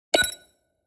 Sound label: HUD futuristic button click